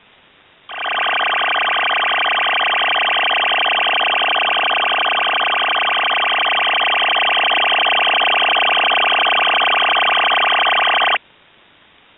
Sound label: MFSK-7 200Bd